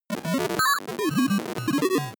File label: broken computer random short